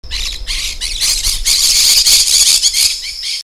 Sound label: Pyrrhura frontalis flush